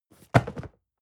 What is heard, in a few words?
cardboard box medium fall; objekte